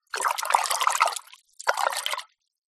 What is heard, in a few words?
household water in glass jug splash agitate fingers fast; zapsplat